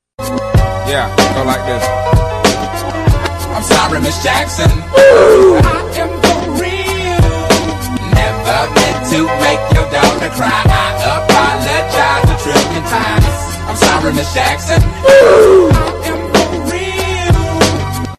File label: oof